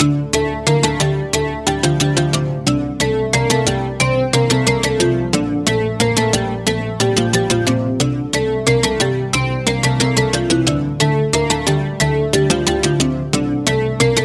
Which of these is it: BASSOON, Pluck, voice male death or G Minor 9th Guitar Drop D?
Pluck